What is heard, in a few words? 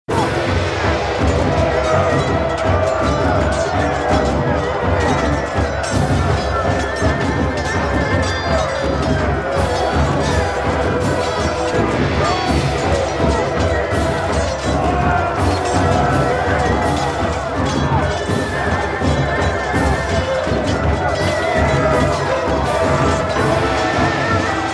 oe battle w sfx; Oriental Empires